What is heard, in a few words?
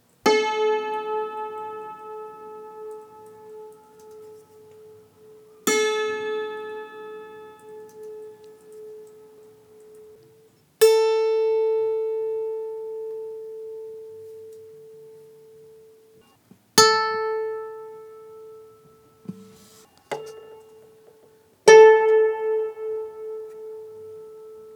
440 Different Instruments